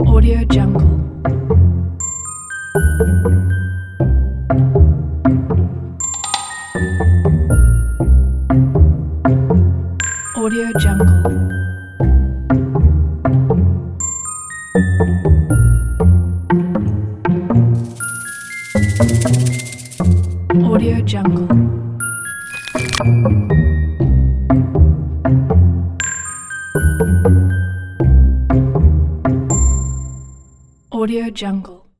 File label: sneaky spy loop